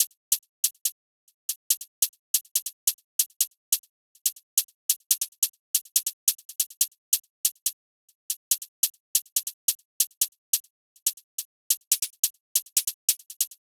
Drum Loop - 141 BPM Hihat, Drill; Loop Stems; PARADOX II - Drum Loop Collection; Cymatics; Harpoon Drum Loop

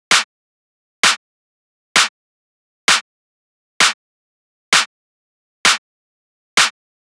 Clap Loop 130, Techno; Ghosthack; Free Techno Sounds 2024

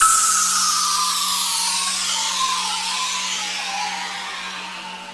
Overhanging Downlifter, Dubstep; One-Shots; Effects; Ghosthack; Dubstep Sounds 2025

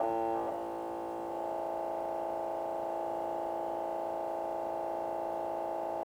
Glitch

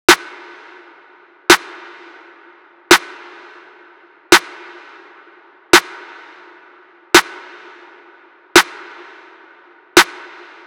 Drum Loop - 170 BPM Snare; Cymatics; Anthem Drum Loop

